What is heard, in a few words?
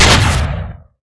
shot; svencontra2